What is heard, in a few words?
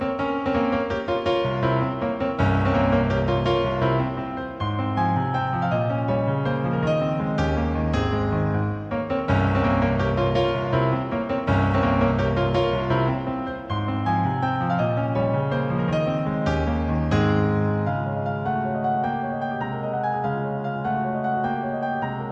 beautiful piano ringtone; i phone 6s